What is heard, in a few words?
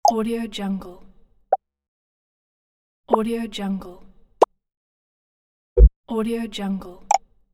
pellet collect